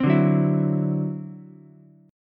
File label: D 9th D down Ukulele C6; strummed; JGuitar